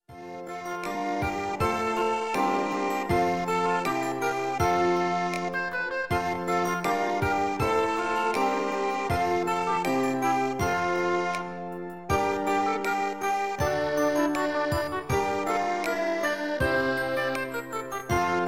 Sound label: F Major, harp